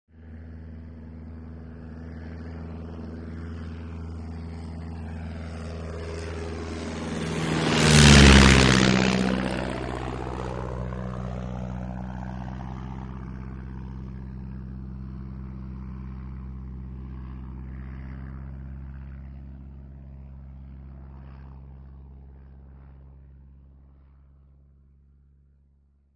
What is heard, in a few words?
Tiger Moth. Steady, High-speed Approach. Loud, Low-altitude Flyby With Doppler Effect. Long, Steady Away. Medium To Distant Perspective. Prop Plane.; D.H. 82